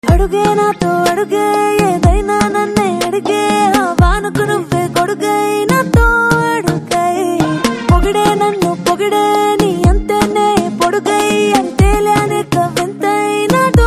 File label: Ringtone